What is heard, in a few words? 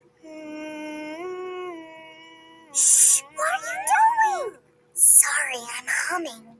raccoon humming; pinch